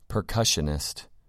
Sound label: percussionist